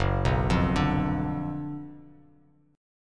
Gb Major 7th Suspended 4th Sharp 5th, Bass Standard; GbM7sus4#5 arpeggiated; JGuitar